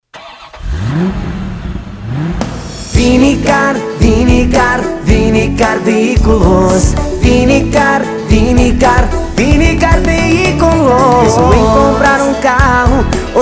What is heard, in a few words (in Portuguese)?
Jingle; Vinicar